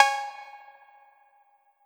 Cowbell; Tron Cat

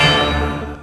hit, FX; Hit 2

